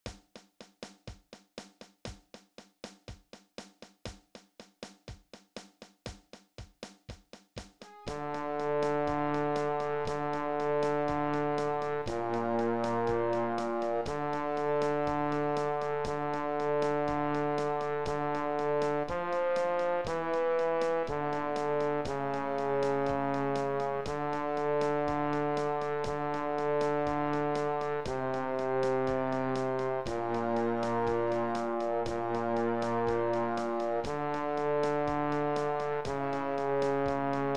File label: bariton